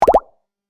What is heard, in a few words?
three pops